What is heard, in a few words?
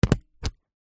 stapler pocket sized single staple card; office